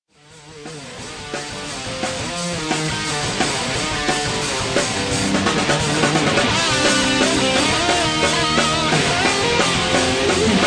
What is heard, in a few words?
Groove Guitar solo